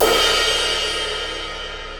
22 inch crash; aax omni; cymbal; Sabian

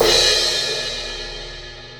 16 inch crash; k constantinople; cymbal; Zildjian

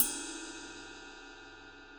22 inch ride ping, cymbal; Matt Nolan; Custom

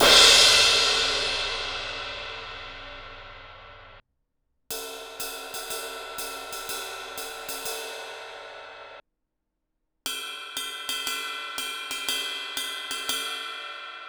18 inch crash crash ride bell, cymbal; a custom efx; Zildjian

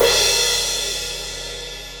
18 inch medium crash, cymbal; 2002; Paiste

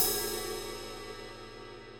18 inch traditional jazz crash ride ping, cymbal; Bosphorus

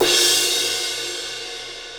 20 inch crash ride crash; Dream; cymbal

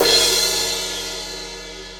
18 inch thin crash crash, cymbal; rude; Paiste

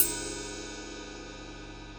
24 inch power ride ping; cymbal; rude mega; Paiste

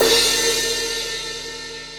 18 inch brilliant rock crash, cymbal; alpha; Paiste

